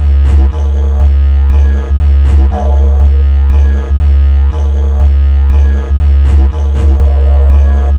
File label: Dig Loop, Ethnic Instruments; Sonic Foundry (Sony Creative Software); The Electronic Music Manuscript Collection